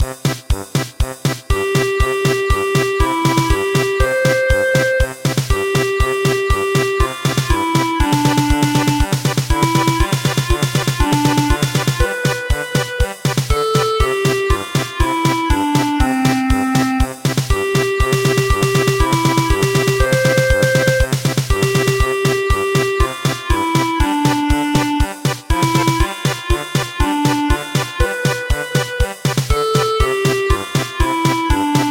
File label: German Polka; Clap hands with the ladybugs